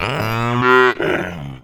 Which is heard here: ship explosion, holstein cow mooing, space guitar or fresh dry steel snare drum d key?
holstein cow mooing